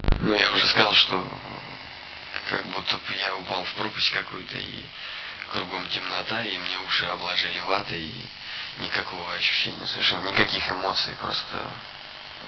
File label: buzz 50hz, TONAL NOISE